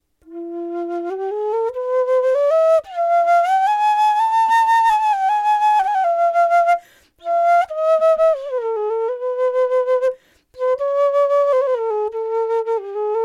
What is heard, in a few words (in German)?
schilfquer f